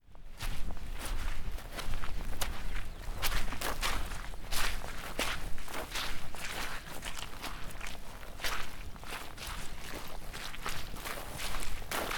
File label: Walking In The Mud